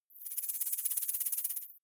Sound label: snake rattle